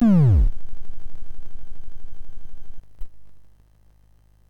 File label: Project Firestart, MOS6581R4